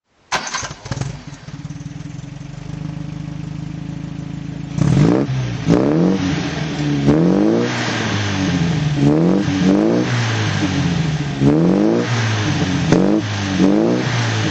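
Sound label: mg tf 1250 throttle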